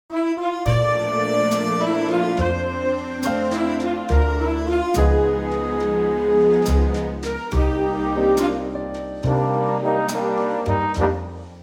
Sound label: Bb orch